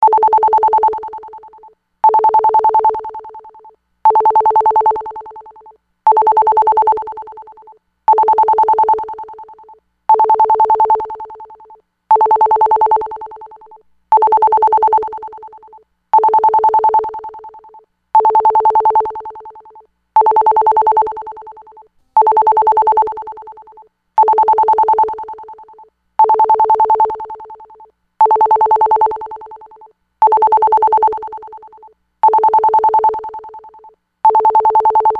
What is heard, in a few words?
echo ring